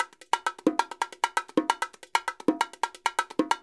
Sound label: 132, Percussion works, LOOPS; Sampleheads; NYC Percussionworks; 90 s Sample CDs